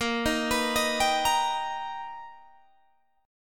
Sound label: B Augmented Major 7th Bb, chord